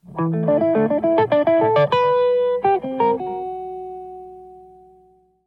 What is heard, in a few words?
Riff